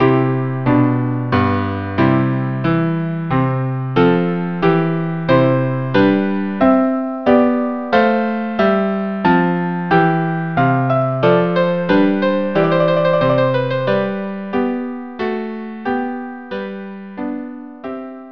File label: andante larghetto